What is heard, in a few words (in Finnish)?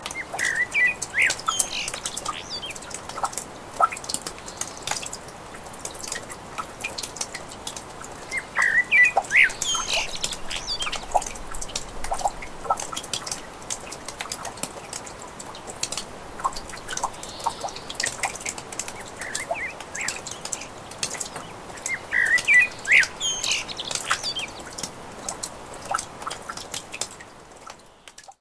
kevat